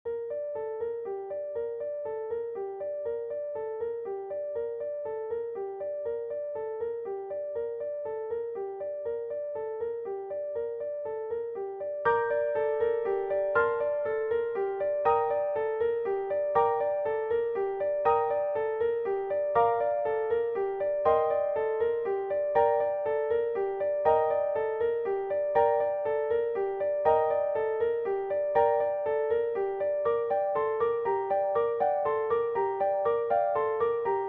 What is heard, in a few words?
carol of the bells piano